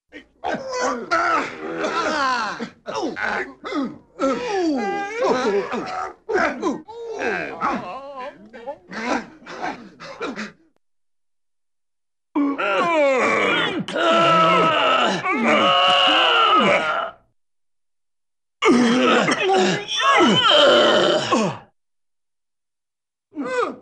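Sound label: Small Group Of Men with various Grunts; Cartoon; Hollywood Edge; Cartoon Trax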